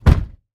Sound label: car door close; NS Studios - ultra sound collection; vehicles